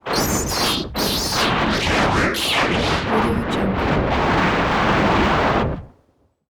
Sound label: energy distortion